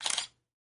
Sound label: shutter